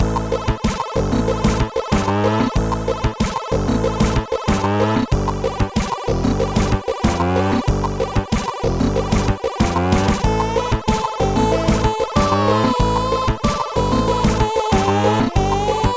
techno waves; Trackers; Modland; Ice

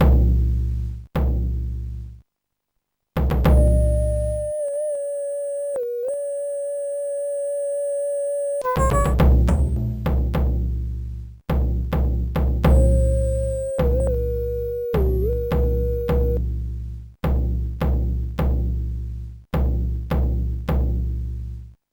lose game; Sonix Music Driver

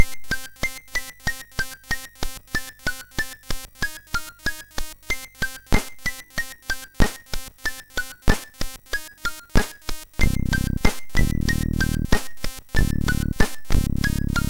Rap

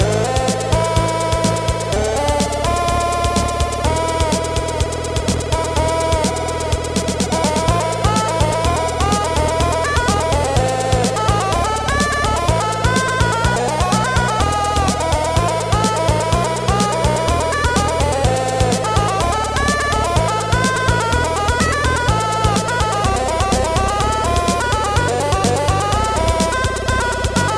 mod chiptune

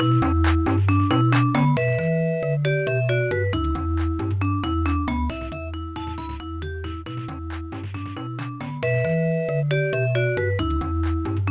fusion; Deluxe Music Construction Set

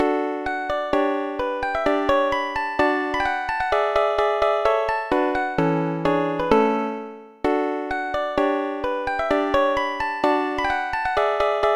allegret; Deluxe Music Construction Set

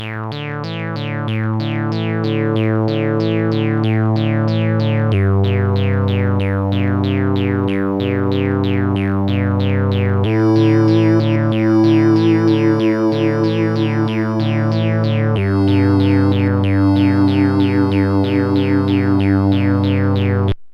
deep sea blues; MusicLine

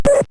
hit; Digital Sound Studio (DSS)

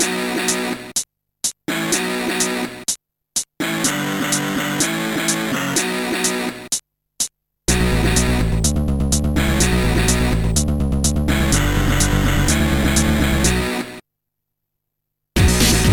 techno rock; Chili; Trackers; Modland; techno rock MIX

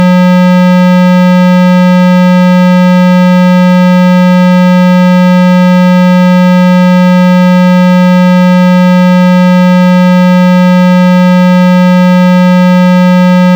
ambient techno MIX; MED; Robin

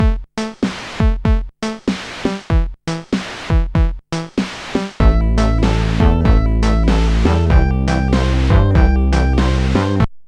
distortion; MusicLine

